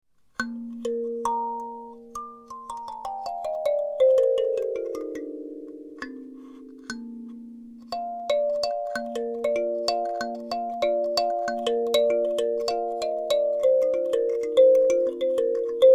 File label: Bb Treble, kalimba